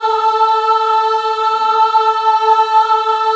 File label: A3 WOM AH, Choir; USB Soundscan vol.28; Acoustic & Synth; AKAI